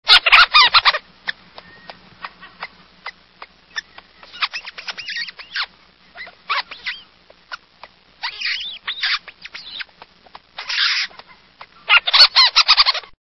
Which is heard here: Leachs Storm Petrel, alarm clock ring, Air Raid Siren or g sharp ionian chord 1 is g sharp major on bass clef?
Leachs Storm Petrel